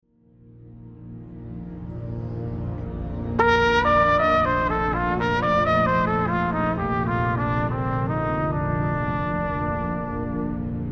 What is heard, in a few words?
Drone; Air and Flow Study 8.1